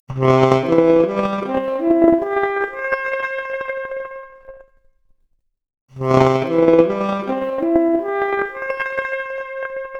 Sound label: Saxophone arp, mp Stereo output noised, 4bit